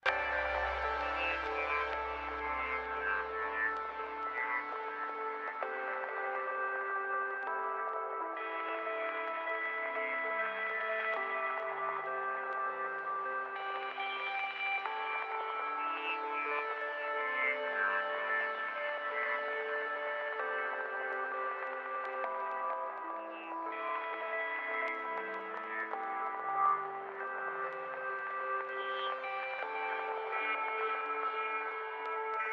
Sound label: music; The Red Strings Club; Switch gamerip 2019